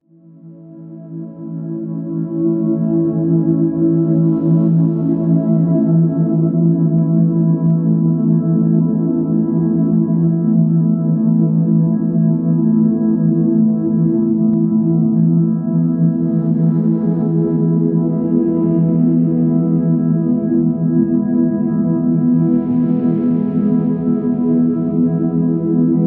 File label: Dog Chill Out Music